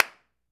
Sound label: clap, Roland MV8800